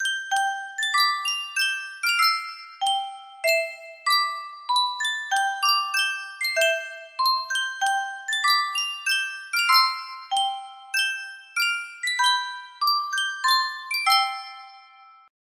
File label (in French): yunsheng boite a musique, music box melody